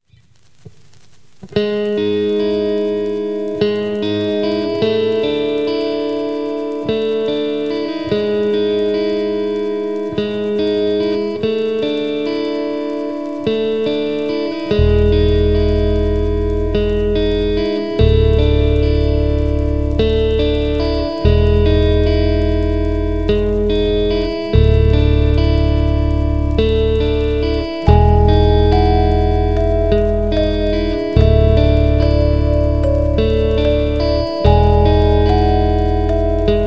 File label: Glockenspiel